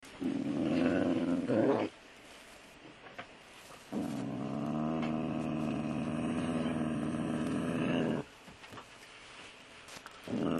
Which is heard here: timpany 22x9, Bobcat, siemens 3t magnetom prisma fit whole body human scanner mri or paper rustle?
Bobcat